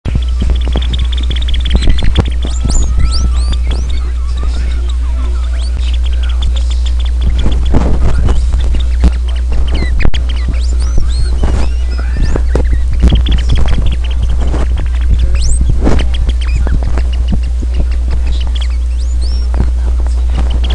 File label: degu